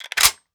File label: mag7 clip in, weapons; Counter Strike Model Pack; CS2